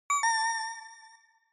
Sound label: Inspiration Low; Onoma